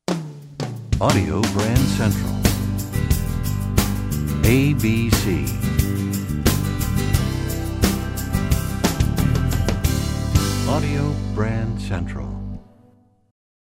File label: Desert Wind, radio jingles